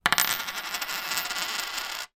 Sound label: foley money coin x1 down on table spin; zapsplat